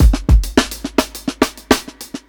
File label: 105 16TH LINEAR BEAT, DRUMS LOOPS; BASEMENT DRUMS VOL 2